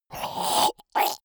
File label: monster choking zombie short; comedy-cartoon; soundpool